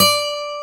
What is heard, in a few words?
ACOGTR